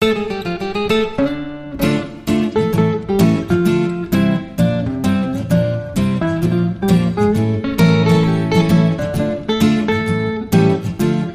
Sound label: God Rest Ye Merry Gentlemen, without Jingle Bells